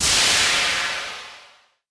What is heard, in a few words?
pow jump